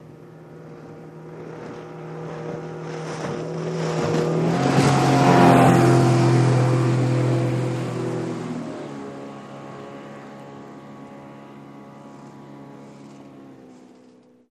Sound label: 150 Hp Mercury Pass By